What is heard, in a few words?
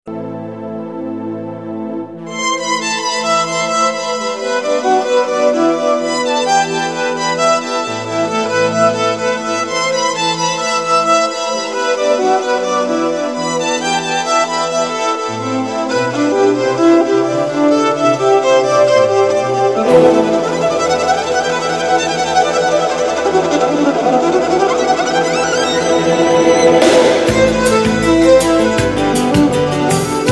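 violin beautiful music with piercing violin; skripka krasivaya muzyka s pronzitelnoy skripke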